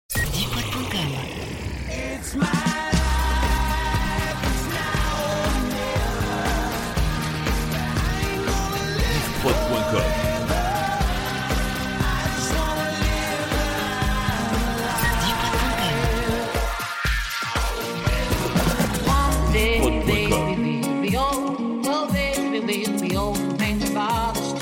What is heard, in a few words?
Medley Pop Rock Funk